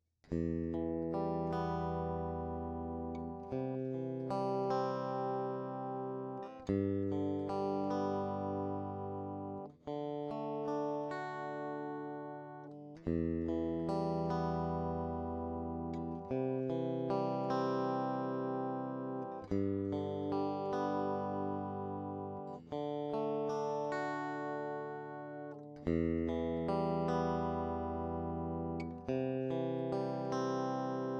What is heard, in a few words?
jensen strat 36db